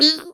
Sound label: vault vocal; Scrat; Ice Age Scrat's Nutty Adventure